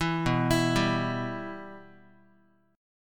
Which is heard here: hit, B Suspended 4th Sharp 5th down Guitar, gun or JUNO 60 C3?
B Suspended 4th Sharp 5th down Guitar